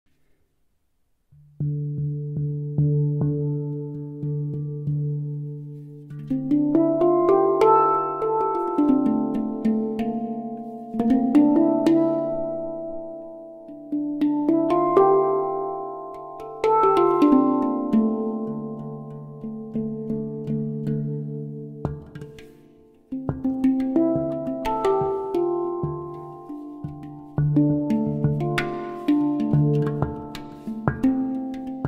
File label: silver coloured handpan 9 notes d kurd